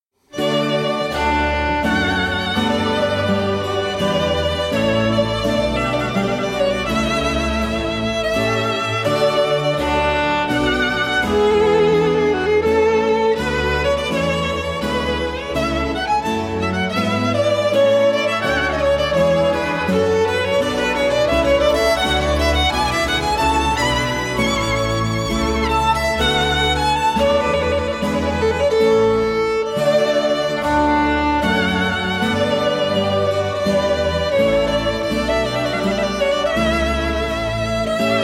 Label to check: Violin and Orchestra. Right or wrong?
Right